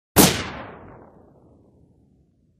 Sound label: Gun Shot Lrg Shot